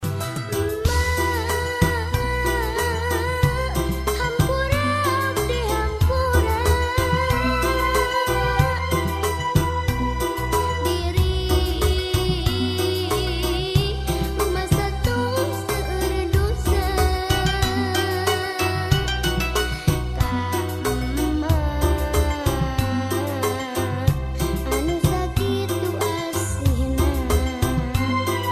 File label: Sunda Song